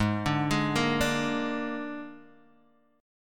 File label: G sharp Suspended 2nd Flat 5th G sharp, guitar; arpeggiated; 4,5,6,3,3,x